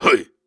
Grunts and Chants, Viking Vocal

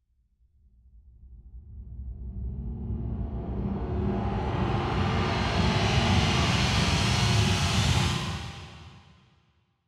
Riser

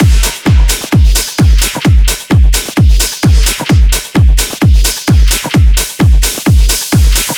Drum Loop 130, Techno

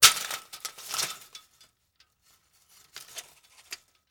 Blinds Rattle Smack; Fairlight Sound Lib

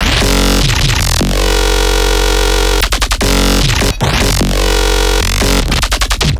Bass Shot F# 150, Dubstep Basses; Loops; Jigsaw; GhostHack; Advent Calendar 2021

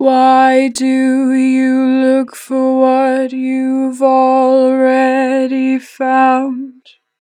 Natural Vibrato, Vocals, Why Look, 4 bar vib; Harmor Vocals

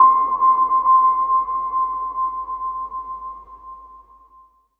KEYS Deep Space (C), One Shots; Cymatics; Mystery Pack Vol 4

